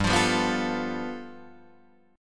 G 9th, Guitar Open D

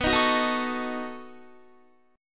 B 9th B down Guitar; strummed

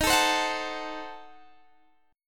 D sharp Diminished 7th D sharp; strummed

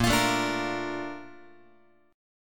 A sharp Major 7th, Guitar

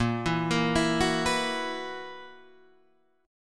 Gb 11th Bb

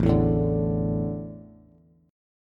A Suspended 4th, Bass; Asus4 strummed; JGuitar

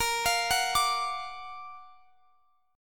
Gb Augmented Major 7th Bb

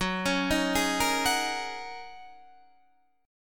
Gb 6th Add 9th, Guitar, DADGAD

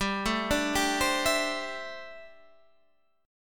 D 7th Suspended 2nd Suspended 4th, G

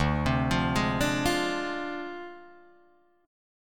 D Minor 7th Flat 5th Guitar Drop D

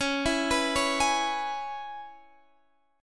Db Minor 7th Db Guitar Half Step Down

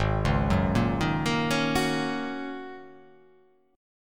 G sharp 9th Suspended 4th G sharp

